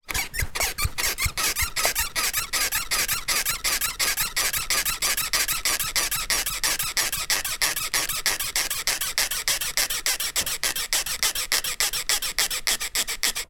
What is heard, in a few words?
household bouncing on squeaky chair or bed